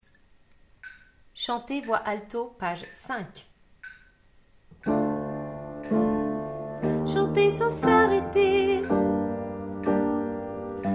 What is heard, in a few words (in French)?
chanter alto